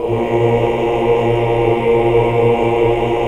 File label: AAH, AAH OCT; Best Service; Hallelujah